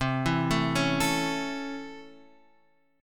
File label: Db Minor Major 7th Sharp 5th C